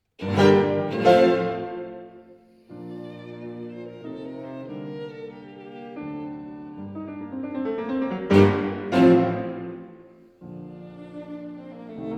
Trio G Minor, Allegro

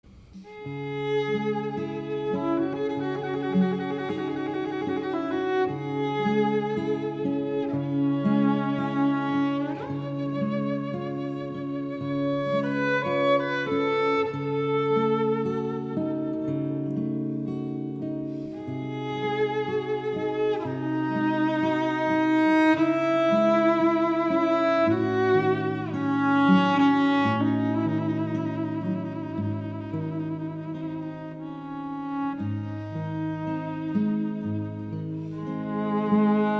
Nocturne in C sharp minor

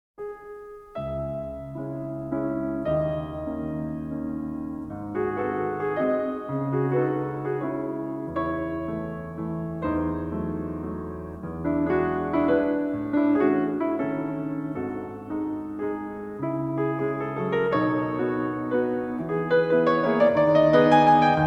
Waltz F sharp minor

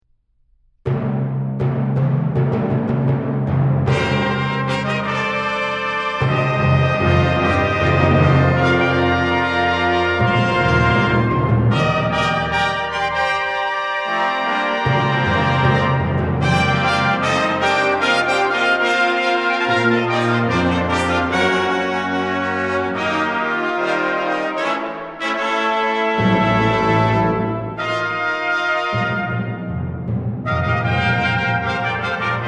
Pentecost Fanfare

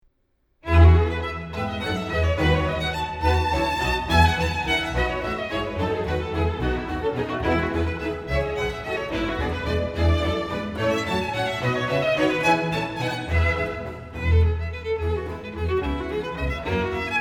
Violin Concerto No. 2 in E Major

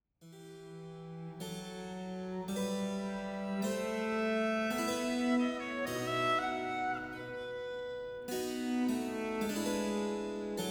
Violin Sonata In G Major; Sonata in G major for Violin and Continuo, BWV 1021; 8. Largo

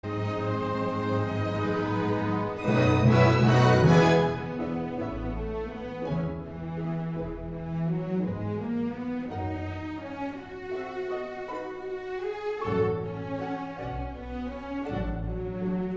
Chant Bachiique; Sylvia

